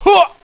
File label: pain